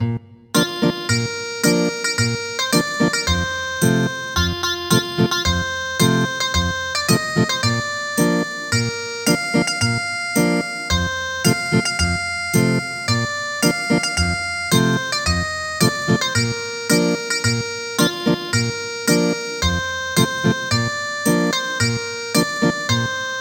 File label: Ethnic Guitar